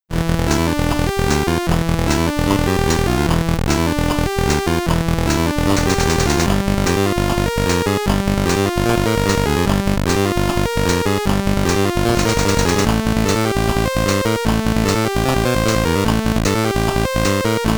Title Screen, Commodore 64 SID; predator; SID Stereo

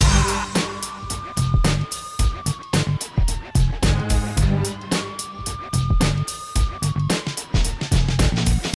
Police Short Loop; True Crime New York City; Xbox; Shared Da Police Short Loop

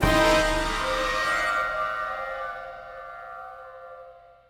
Hatch Drop FLOURISH; bolt

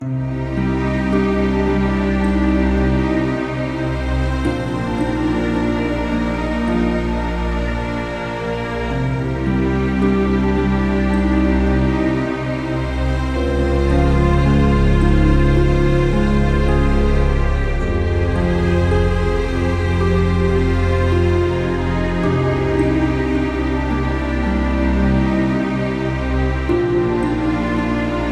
Mission Failed; Platoon

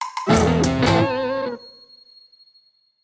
Sting

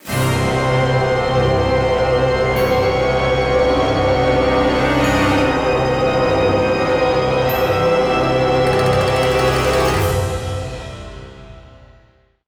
Course Intro Fanfare; Evilocity

